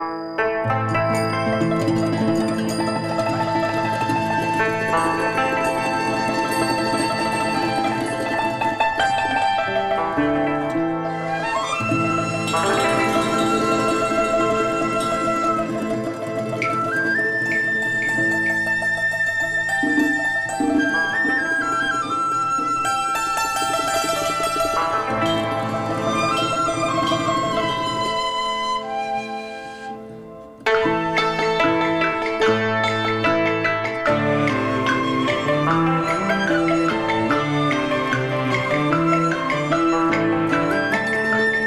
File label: TRADITIONAL Chinese, Picking Flowers